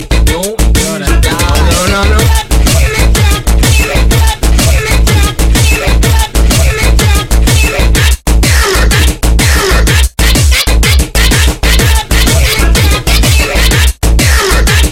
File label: remix